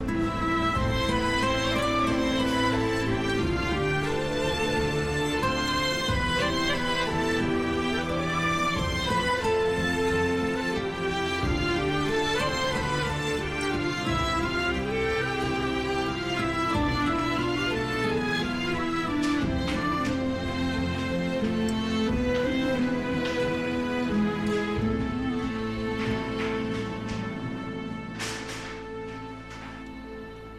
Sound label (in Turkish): klarnet and keman versiyon